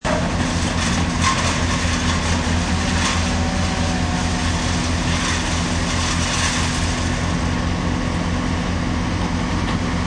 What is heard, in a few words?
Wood Chipper, Powerful industrial wood chipper grinding large branches and chunks of tree; Powerful industrial wood chipper grinding large branches and chunks of tree trunks; Power Tools